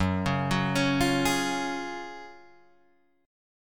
F sharp Major 7th Suspended 2nd F sharp, Guitar Drop D